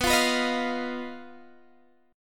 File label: B Major Flat 5th B Guitar Standard; BMb5; strummed; 19,20,21,20,18,x